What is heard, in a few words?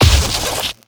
arc emitter fire, weapons; Stellaris